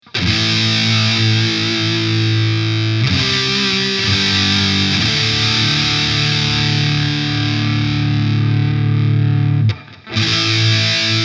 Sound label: full gain; Phoenix